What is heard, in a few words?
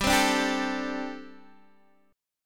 G Suspended 2nd Suspended 4th, Guitar DADGAD